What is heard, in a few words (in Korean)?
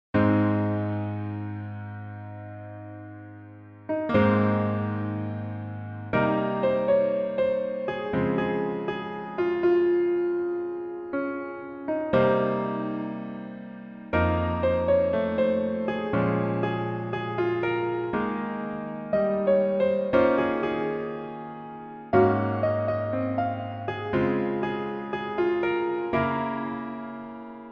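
Piano version 멜로디MR 여자키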